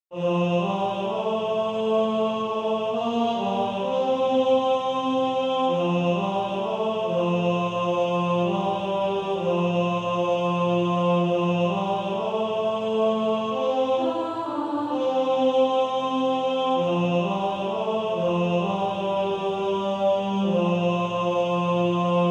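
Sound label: Male Choir, tenor 1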